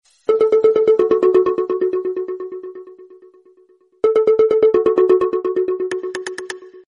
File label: cool mobile alarm tone ringtone, sms ringtones